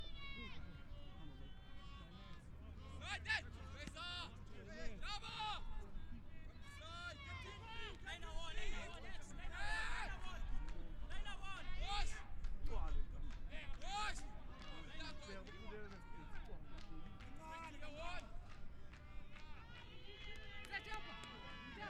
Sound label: Soccer Game Freekick